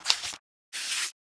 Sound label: ui sale interface next